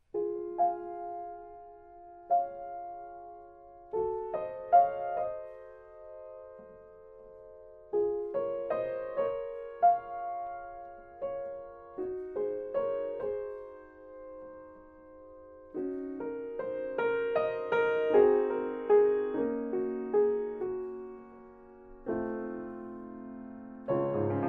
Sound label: Piano AT4050, mic shootout